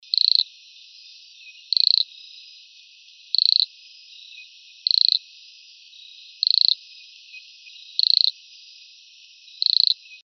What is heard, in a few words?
E unicolor, coqui